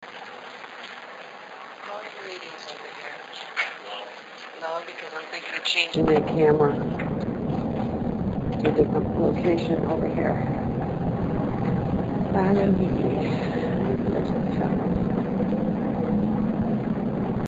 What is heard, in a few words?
EVP